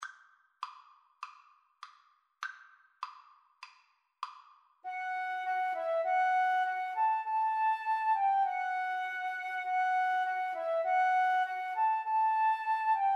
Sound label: angels Violin Flute Duet